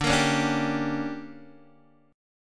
D Major 7th Suspended 4th Sharp 5th, Guitar DADGAD